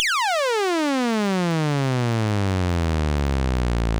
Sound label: Oscillator Test; We can hear that the sound starts with a high pitch and gradually changes into a lower frequency.; Wolfram; AnalogModeling; modelica